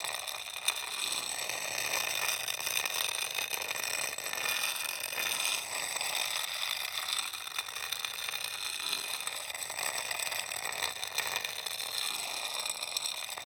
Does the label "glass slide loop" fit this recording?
Yes